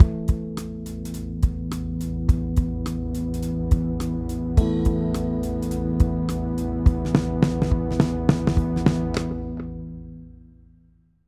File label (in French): jingle gauche droite; fabfilter proq3